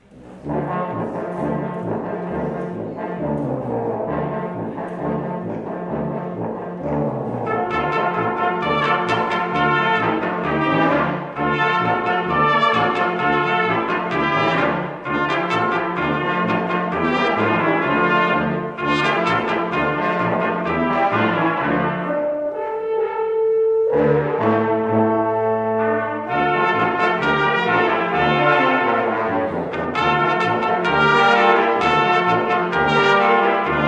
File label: Brass Quintet; holiday; Hanukkah Oh Hanukkah; hs holiday runout